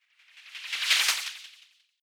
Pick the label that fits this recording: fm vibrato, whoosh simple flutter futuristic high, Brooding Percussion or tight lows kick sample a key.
whoosh simple flutter futuristic high